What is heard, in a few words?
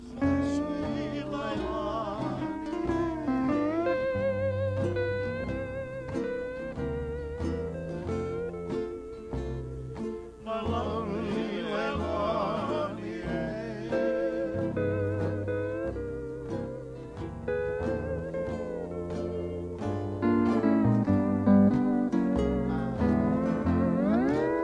nice lick; c6th